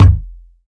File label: warm sounding kick one shot F key, Royality free kick drum sample tuned to the F note; kick drum samples